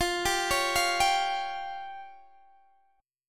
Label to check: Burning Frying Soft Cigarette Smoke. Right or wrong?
Wrong